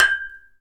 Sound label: Hit Golfball Metal; Misc Sports